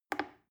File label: plastic button little click soft fast